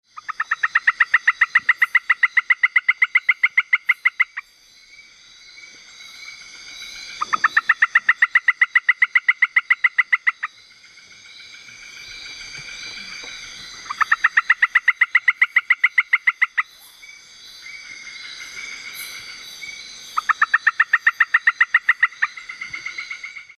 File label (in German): bako nationalpark sound frosch